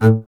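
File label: string contrabass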